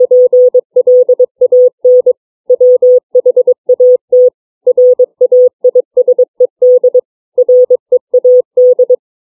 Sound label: CW print letters